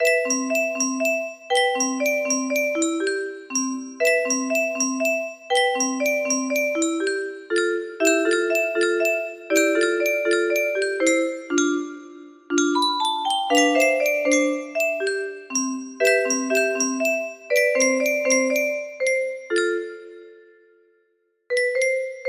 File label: music box melody